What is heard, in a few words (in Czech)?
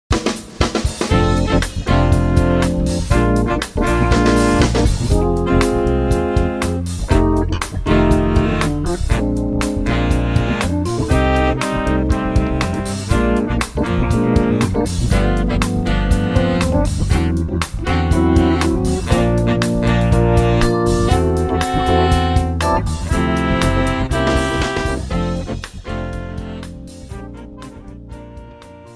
Filmový jazz